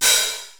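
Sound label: 909 open hi-hat; 480L; Wizoo; Platinum 24 Electronic; 480L GA 909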